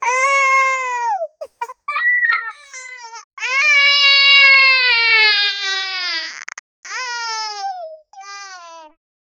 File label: child crying